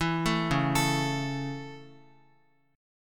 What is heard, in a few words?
Db Minor Sharp 5th Db down Guitar